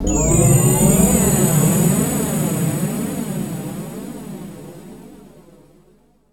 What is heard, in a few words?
Guitar Textures